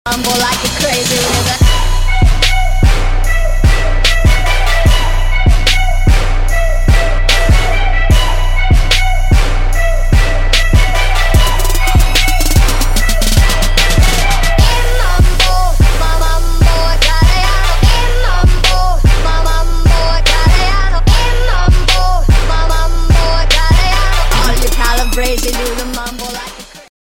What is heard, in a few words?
mambo